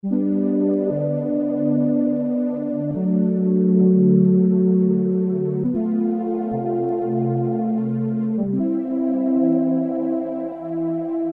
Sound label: buttery loop